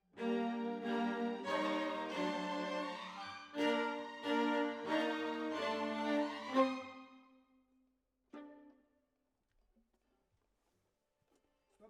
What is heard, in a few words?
Violas, jazz for piano